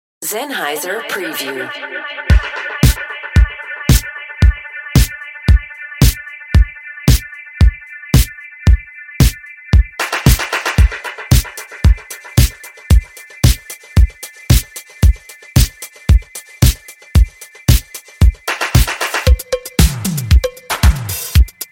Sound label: Linndrum The Drum Machine